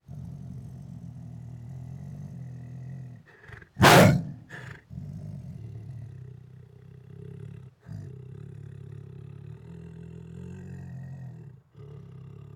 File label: Siberian Tiger Aggressively Growls With Roars and Snarls; Sound Ideas; Tigers Sound Effects